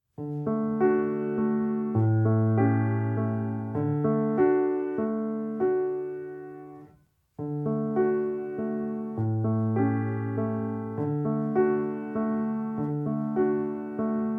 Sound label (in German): wiegenlied